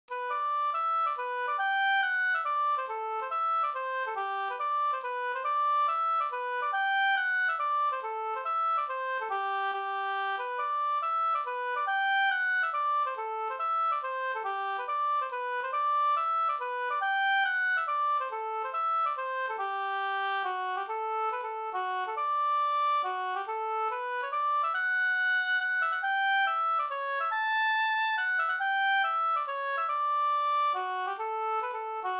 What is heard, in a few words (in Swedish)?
hambopolska; Driver dagg faller regn Hs